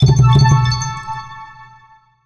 drums reward

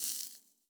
Soft Dry Rustle

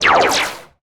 Sci-fi Laser Gun Blast

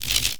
Buzzing Power Glitch

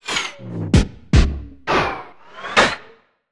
Sci-fi Malfunction Rumble

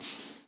Soft Slide Short

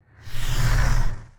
Swoosh Transition

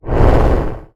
Swoosh Transition

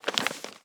Dry Short Paper Crumple

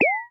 Bleep

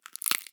Wood Crackle

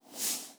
Soft Slide Short

